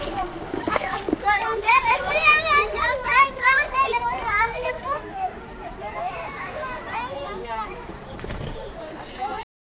school ambience with kids, india

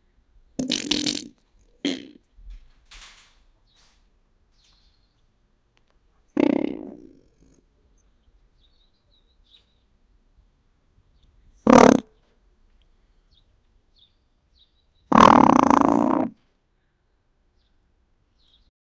sound of turkey being; burp

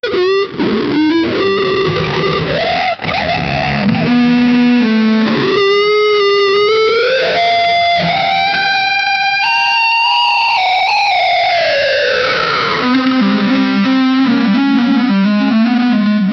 generate brown noise

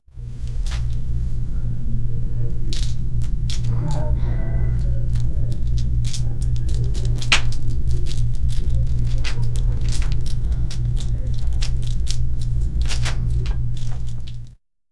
lots of people selling co, market

